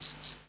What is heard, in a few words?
cricket double